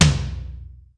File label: LAUNCH TOM L, drum; Crypton - DrumBase5000